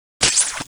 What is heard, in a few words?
web shoot